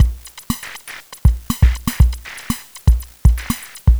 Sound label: Anabeat Mix, Proto Techno; Sonic Foundry (Sony Creative Software) - Crimson Blue and Fabulous Horncraft 4 RnB